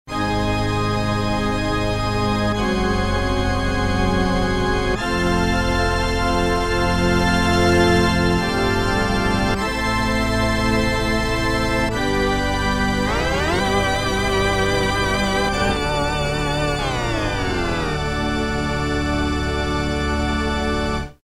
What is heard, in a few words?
Mod Amb. Pipe; Roland M-DC1